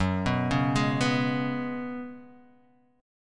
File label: Gb Suspended 2nd Suspended 4th, Guitar, Half Step Down